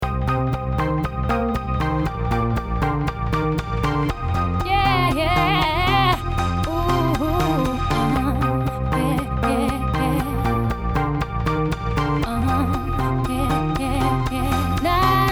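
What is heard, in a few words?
dubstep rise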